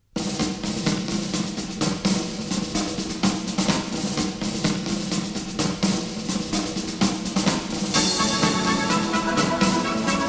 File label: music